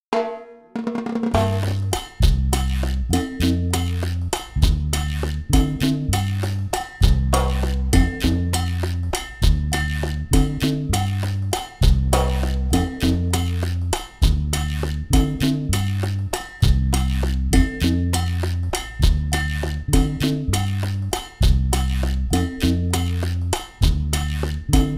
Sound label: Chachacha